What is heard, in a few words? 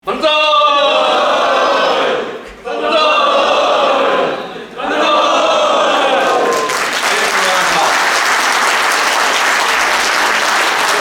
banzai